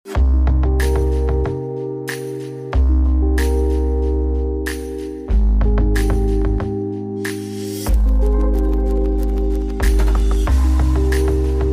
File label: Lounge Background